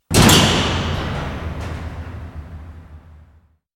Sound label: jail cell shutting